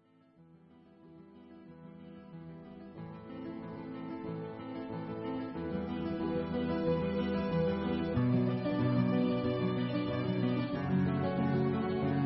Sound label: Background Music